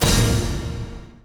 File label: Final Battle - Combo Stab